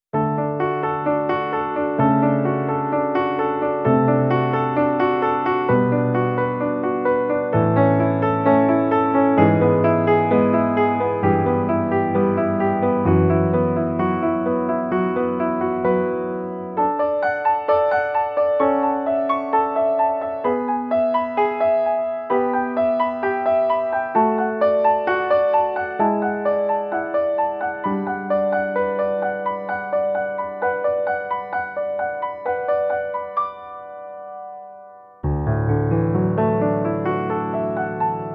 Nord Piano 3 Velvet Grand Large

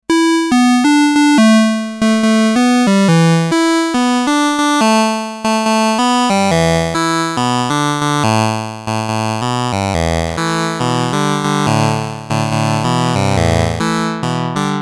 Divider

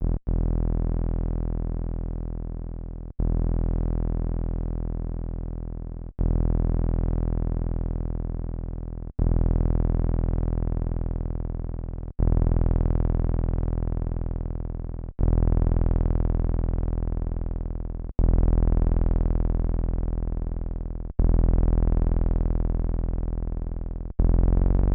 Korg Nubass SAW Pitch Sub Sat

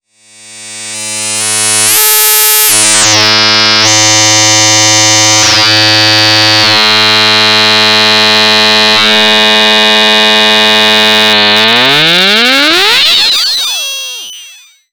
E-RM Polygogo Doepfer A-110-4 Sine in Hard Sync

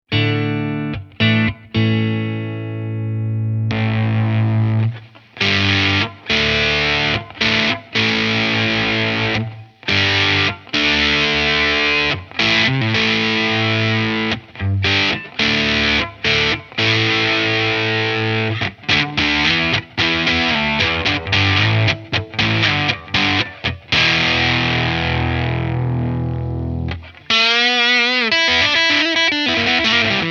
Zonk Machine clean Amp FUZZ; British Pedal Company Compact Series

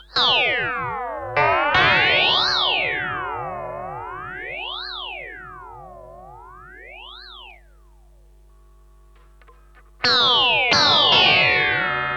Radius Freq Shifter; Red Panda

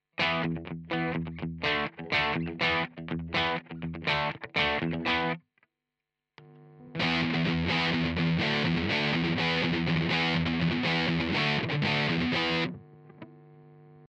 Bridge Kemper Sustain

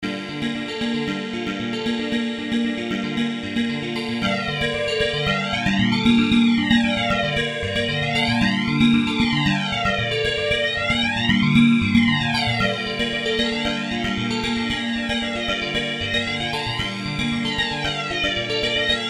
Dual Flanger Synth

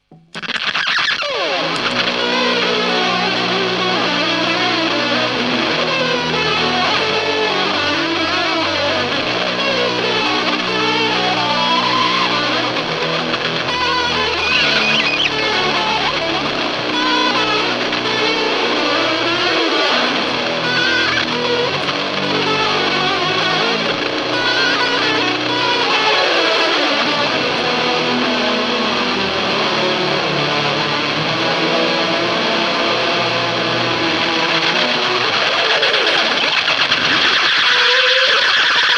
super weirdo modulated fuzz

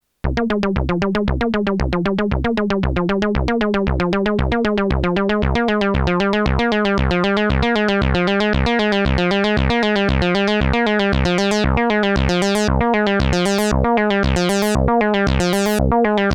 Moog Mother 32 Sequence 303

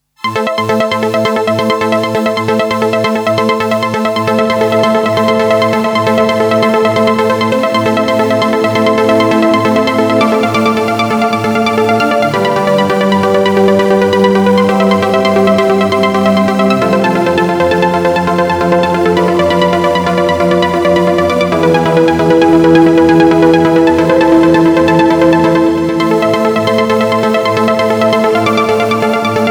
Lexicon PCM70 Echoes BPM Synth

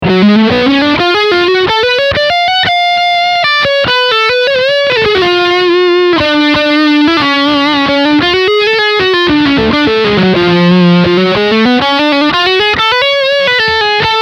Overdrive; ICON I

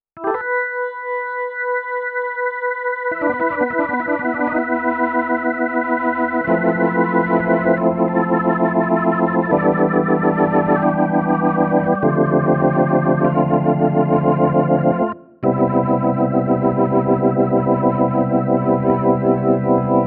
kawai cs10 blues organ

